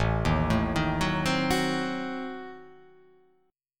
A Diminished 7th A down

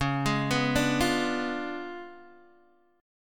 Db 7th Flat 9th, Guitar, Open D

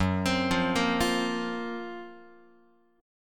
Gb Major 7th Flat 5th Guitar

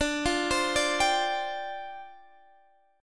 C Suspended 2nd Suspended 4th, D

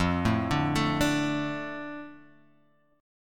D 5th F, D5/F chord; D5/F arpeggiated; JGuitar

